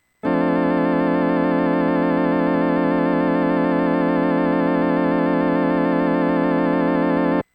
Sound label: fm vibrato